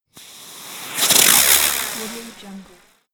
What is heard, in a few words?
fireworks spinning sparks short